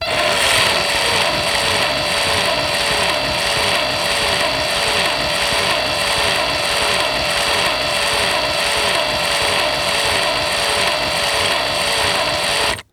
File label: Industry Tool Power Drill Low Spin Opposite Direction